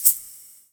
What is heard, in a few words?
One Shot Shaker, Drum N Bass - Percussion; Sonic Foundry (Sony Creative Software); Knutrix Drum & Bass